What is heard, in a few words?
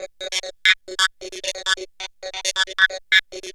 GUITARS 135